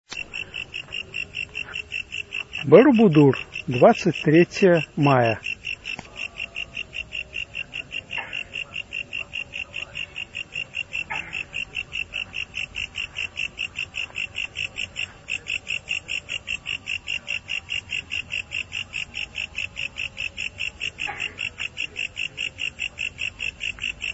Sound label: indonesia sounds; geopoesia